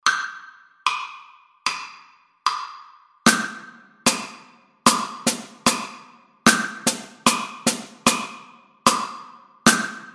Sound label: drum set